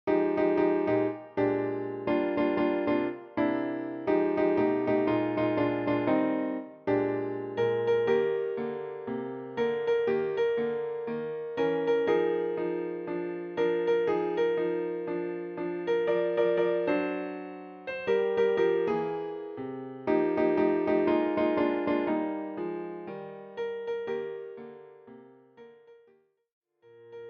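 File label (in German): Klavier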